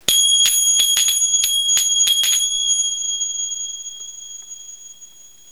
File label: student zills